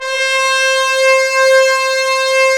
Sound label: BRS, Roland LA Composer; Tbns